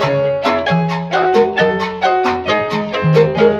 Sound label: guitar mariachi festival; Loops; Phonky